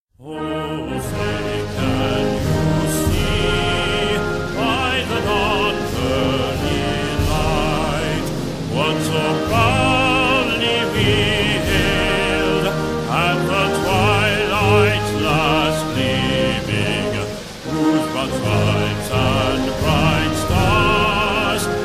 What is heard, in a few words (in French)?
hymne paroles homme